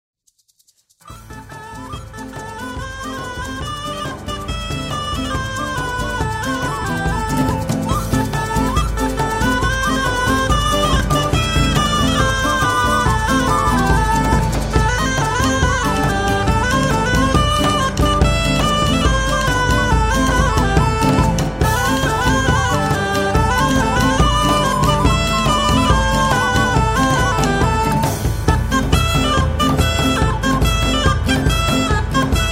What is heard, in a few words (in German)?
bretonisches Medley